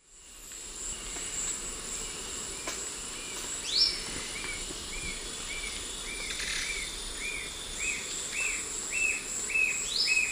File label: Nonnula brunnea, song and calls; S. America; Eastern Lowlands